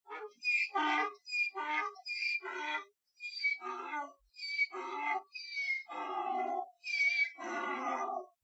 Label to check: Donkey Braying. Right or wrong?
Right